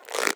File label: Elastic Soft Rubber Creak